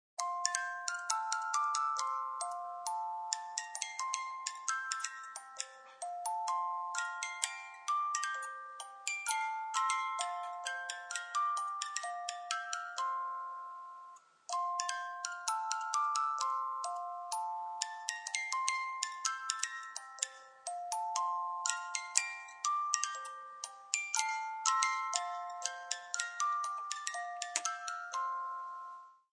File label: Music Bx Mldy, Music box melody.; Toy Melodies; Toys